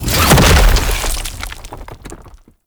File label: Egg Mine Burst; Warframe; Tau; Fallen From Heaven